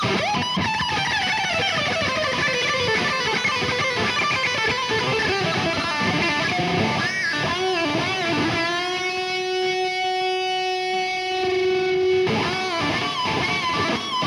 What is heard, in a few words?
Guitar Solo, Live; Maimarktgelände, Mannheim, Germany